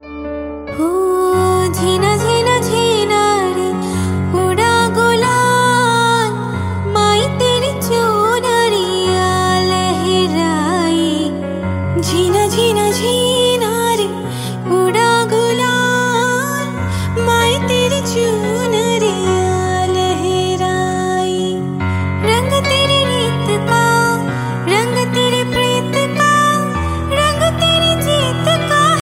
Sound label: female; abcd 2